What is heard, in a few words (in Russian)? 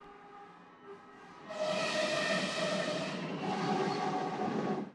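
поезд проезжает на скорости и гудит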